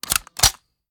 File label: sm uzulu reload empty xxmag charge; reloads; iw8; gmod